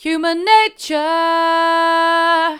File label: mid, vocal